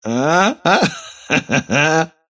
wheel laugh; dota; kez

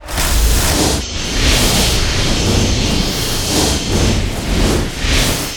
pulse nova; weapons; dota; leshrac

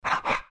pant big, greevil; courier

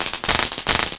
taunt; weaver

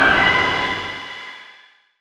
shadow step cast; spectre

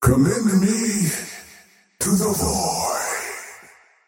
death; dota; faceless void

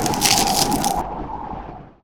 ice wall slow; weapons; dota; invoker

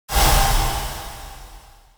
hammer crit; weapons; dota; omniknight

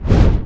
preattack; dota; doom bringer

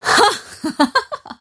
mirana laugh; dota

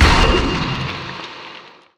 fire wreath smash; weapons; dota; dawnbreaker

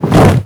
wingflaps heavy; movement; wings

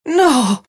death; dota; phantom assassin

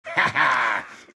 vipe laugh; dota; viper

